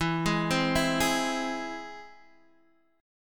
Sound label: Ab Augmented, E